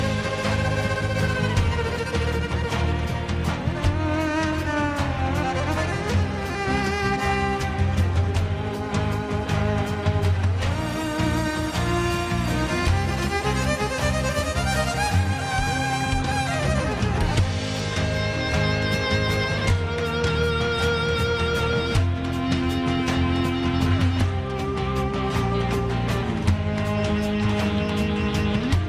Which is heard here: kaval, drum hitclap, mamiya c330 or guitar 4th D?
kaval